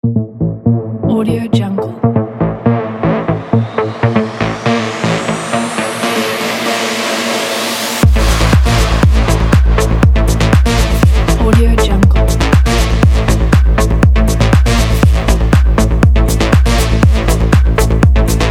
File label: Aggressive Minimal Techno